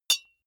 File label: deodorant hit, metal; maschinen; soundpool